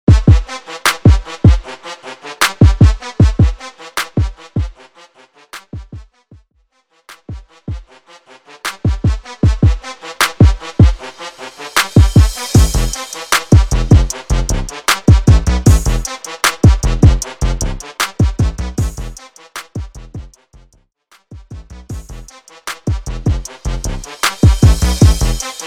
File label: Dirty 77 BPM Trap